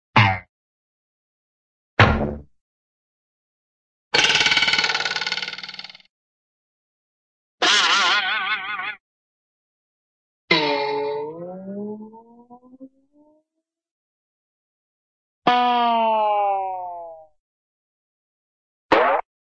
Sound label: twang, cartoon bow twang; hoyt's bow